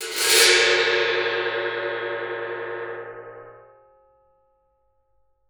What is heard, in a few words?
TAM T; Xsample VOL-9